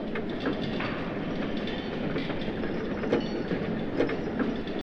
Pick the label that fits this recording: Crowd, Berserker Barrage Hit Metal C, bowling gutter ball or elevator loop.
elevator loop